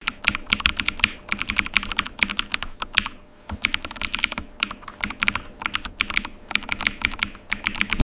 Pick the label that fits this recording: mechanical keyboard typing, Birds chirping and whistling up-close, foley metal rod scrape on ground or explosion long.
mechanical keyboard typing